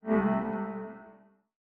Stinger Menu New game

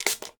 Spray; blastmorph pinball; xbox series xs

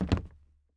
plastic land

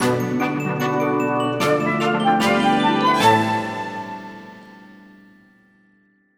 music jingle level end; The Smurfs 2; Xbox 360

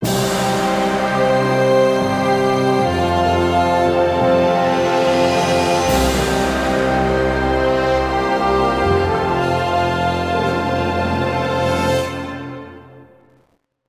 Reward Perle Long; Beyond Good & Evil; GC, PS2, Windows, Xbox

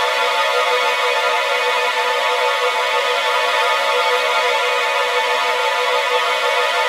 full strings loop; Shu; ps vita ps4 switch windows

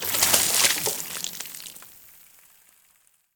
liquid

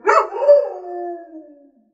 Dog Dead; Prison Architect

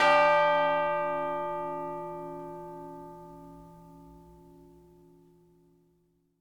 bell

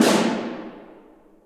Snare

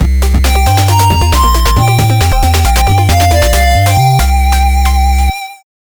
Game-Over; Radirgy 2

